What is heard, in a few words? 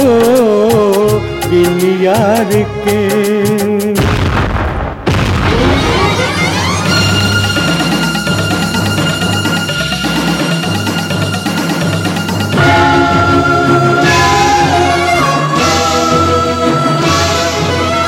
duet